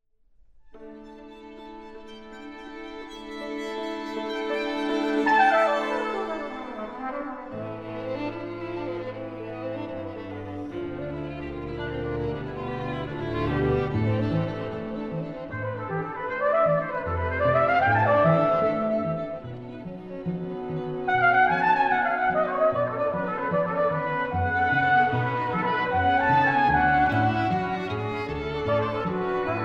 Quintet for Trumpet and Strings, Allegro molto

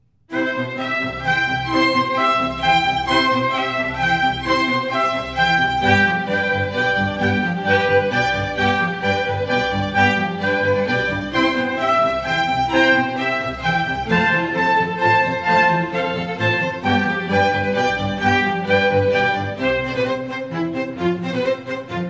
Concerto For Two Trumpets In C, Allegro assai

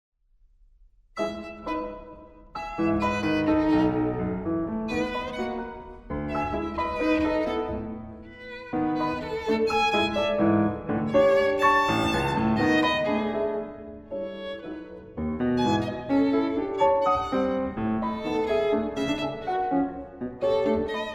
Sonata for Violin & Piano - Fast and Spritely

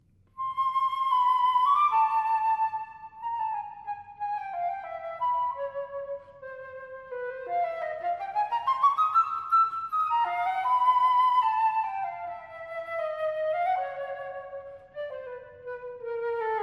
Pieces For Flute Alone, Lied, leicht bewegt

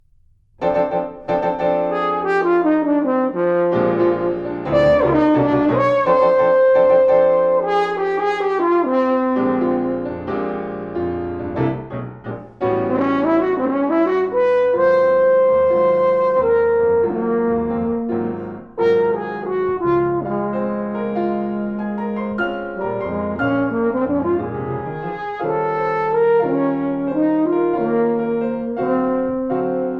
Suite For Horn And Piano, Suitable For Dancing; Alec Wilder

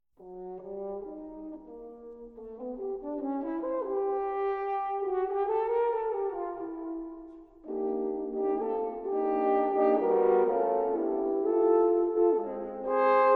Quartet for Horns, Moderato

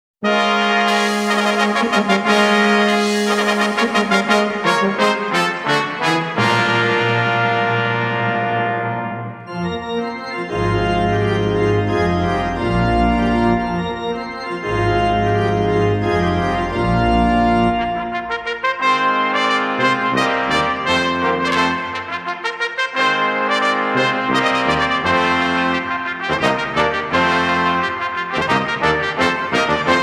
Fanfare; Fanfare La Peri

